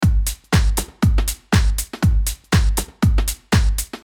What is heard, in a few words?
Drum Acoustic Space